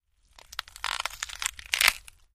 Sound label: Celery Break Slowly; gore stabs & eat